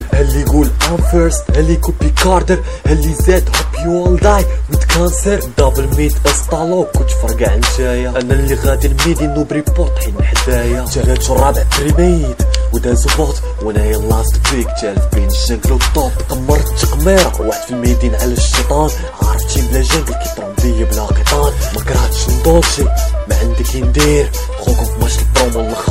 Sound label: super cool moroccan ringtone